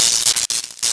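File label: ambience electric arc; Star Wars Knights Of The Old Republic II; XBOX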